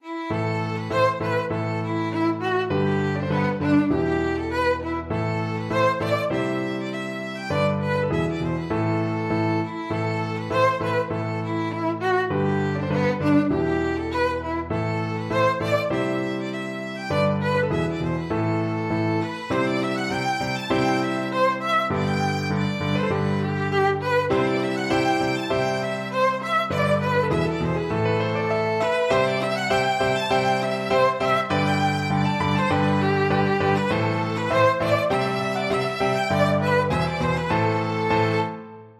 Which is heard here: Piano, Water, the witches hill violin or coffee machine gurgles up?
the witches hill violin